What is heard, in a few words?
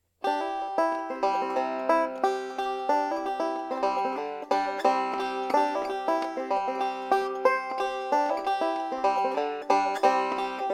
pick, neck wood